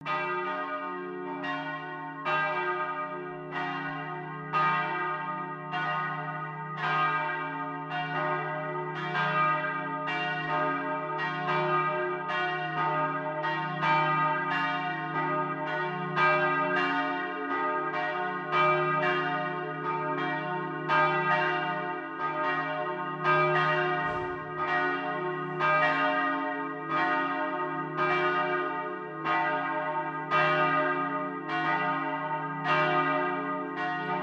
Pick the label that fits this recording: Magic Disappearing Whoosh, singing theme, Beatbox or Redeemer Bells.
Redeemer Bells